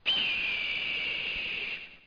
HAWK; DAoC; Toa; Gamestar

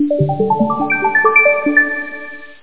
Chime Mac; AlfaClock 1.71

